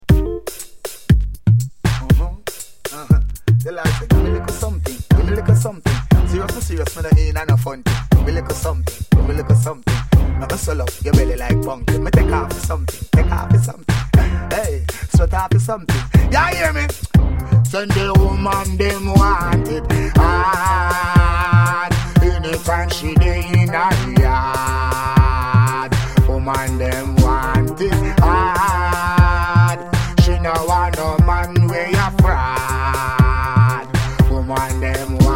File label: riddim